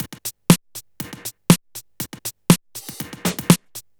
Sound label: Minimal, 120 BPM, Percussion; Sonic Foundry (Sony Creative Software); Underground UK House & Electro